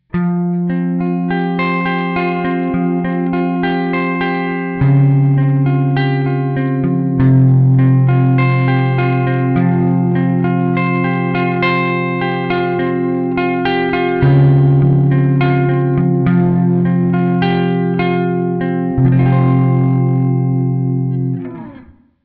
modern clean

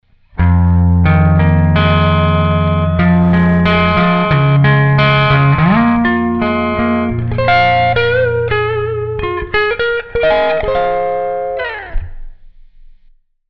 classic clean boost

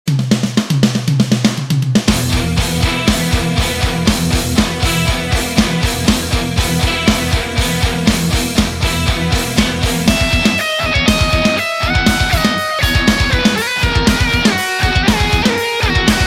AMP Metal; Metal Fusion Chunk; Studio Devil Amp Modeller Pro v.1.0

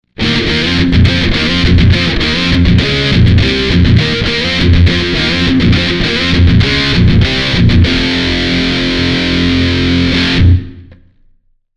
modern lead

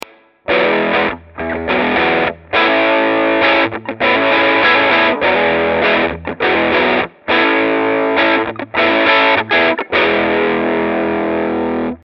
Vox Crunch

